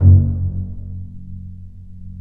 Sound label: Bass Piz E F#0; Digital Sound Factory Virtuoso